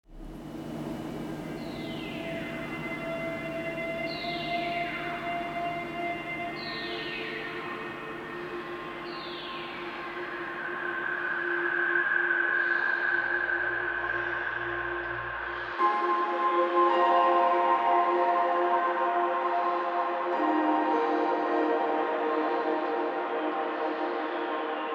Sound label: rhodium ambient; weekly beats 2020